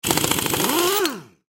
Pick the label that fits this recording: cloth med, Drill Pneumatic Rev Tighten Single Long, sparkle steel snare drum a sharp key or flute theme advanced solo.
Drill Pneumatic Rev Tighten Single Long